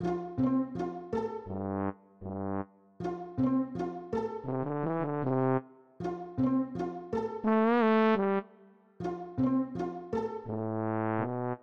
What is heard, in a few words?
Cartoon Groove